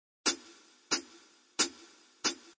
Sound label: Jazz closed hihat loop at 180 bpm.; Drum Loops